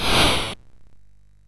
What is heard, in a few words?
sid MOS6581R2; hvsc